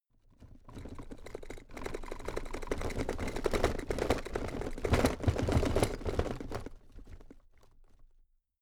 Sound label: drawer cabinet stuff rattle